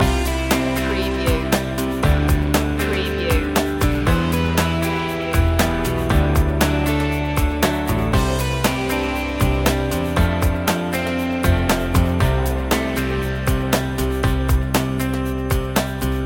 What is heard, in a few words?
loop, chill